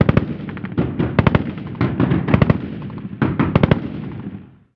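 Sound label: gun us assault medium; ModernWarfare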